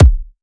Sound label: Kick, dubstep; Ghosthack - AC2024 - Day 13 - Dubstep Sounds 2025; Frost Kick